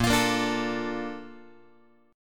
A sharp Major 7th, Guitar; A#M7; strummed; JGuitar

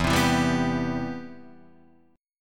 C Major 7th, E, guitar chord

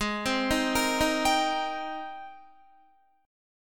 Ab Major 9th Ab, Guitar; AbM9 arpeggiated; JGuitar

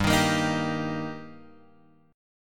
G Minor Sharp 5th G Guitar Drop D, chord

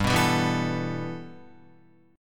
G 6th Add 9th G Guitar, chord; G6add9 strummed

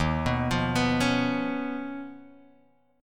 Eb 7th Suspended 2nd Sharp 5th Eb, Guitar Drop D; 1,2,3,4,2,x; down

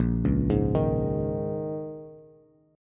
Bb Minor 11th Bb down Bass Bass Standard; arpeggiated; JGuitar

